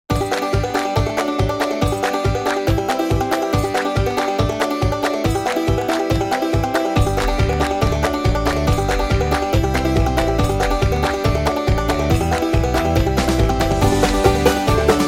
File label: Bluegrass